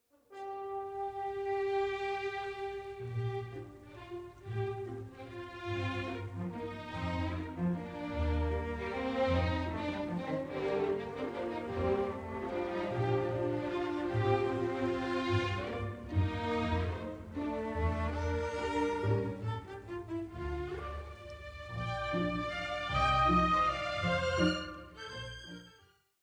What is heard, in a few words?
slow waltz; Mahler